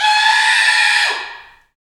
Bullhorn, Afro Horns, Africa

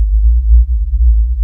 rumble kick; E-MU Formula 4000 Series Vol. 2; OrbitPercMaster

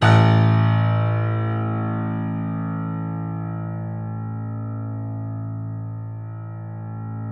A, 3-D Audio Collection, 3D Pianos, Yamaha C7

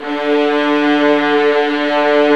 ARCO VIOL, Arco Violas

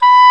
Oboe f; Roland L-CDX-03 Disk 1

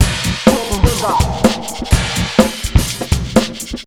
beat, Electro 125, Big Beat Drumloops; USB Soundscan vol.25; AKAI

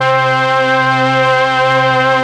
STR, STRING

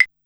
Claves, Korg Wavestation-SR, Drum Kit; Wave 455 - Drum Kit

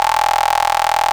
RAVE BEEP; Best Service Alpha Dance vol.2

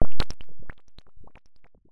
Squelch, Synth FX; musicradar; cartoon caper

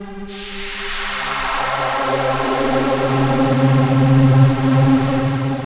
C, Drum & Bass Pads

